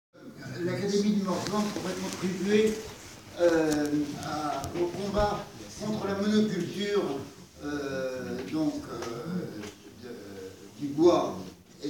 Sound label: vocal